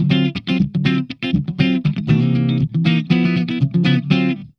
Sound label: FAST CHUG, Funk Guitar; Zero G - Funk Guitar